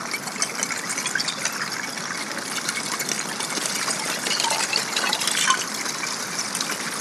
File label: Icy Stream, On The Glacier